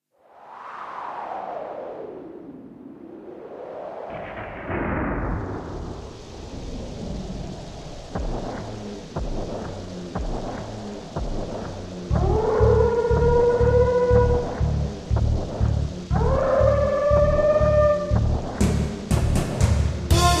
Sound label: Backing